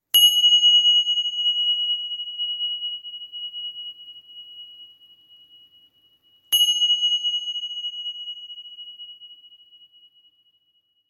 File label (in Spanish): Tingsha PRO dragones 6,7cm FA